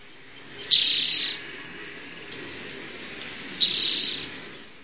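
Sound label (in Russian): двойная трель тауи среди шума машин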